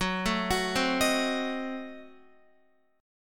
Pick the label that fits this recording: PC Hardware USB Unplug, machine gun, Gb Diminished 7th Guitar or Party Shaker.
Gb Diminished 7th Guitar